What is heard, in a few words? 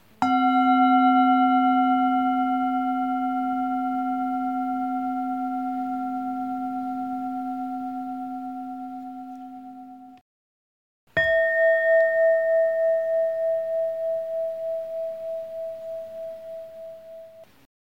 gong